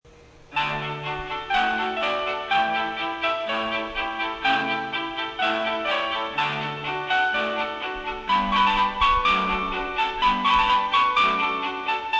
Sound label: tsuchiura melody; jreast